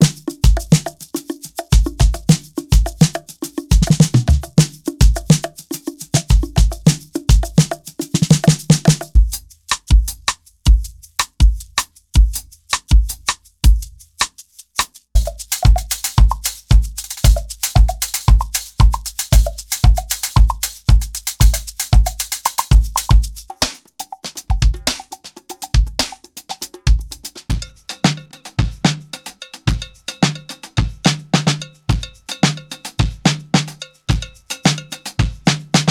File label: Afro Pulse; Wave Alchemy